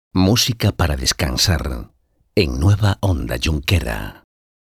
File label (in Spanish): Música descansar